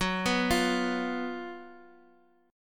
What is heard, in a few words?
F sharp Major 7th Suspended 4th